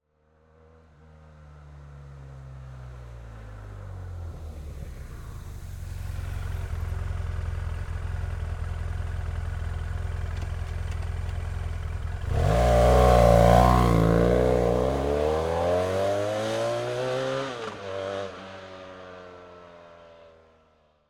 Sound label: BMW F800R EXTERIOR Approach Slow Decelerate Stop Idle Drive Away Accelerate Fast, 4-Stroke 798cc; 2010; MKH8040-MKH30; Dramatic Cat